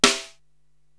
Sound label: SD Off Center, Korg TR-Rack drum machine